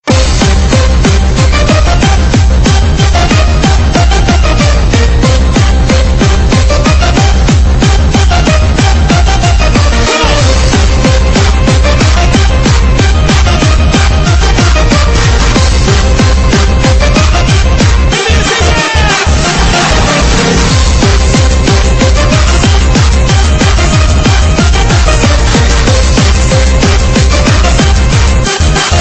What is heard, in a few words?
Techno